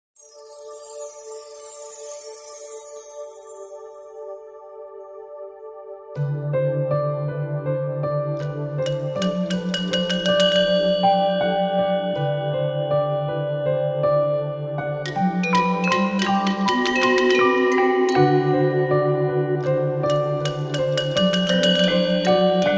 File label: Dreamland Piano Remix